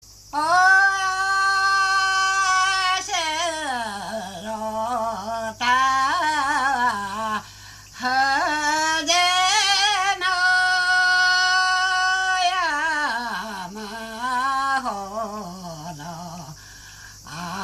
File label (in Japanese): taueuta, minyou; 田植歌; 労作歌; 横芝光町; 木戸